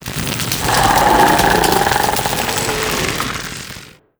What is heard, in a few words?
getup medium, zombie revived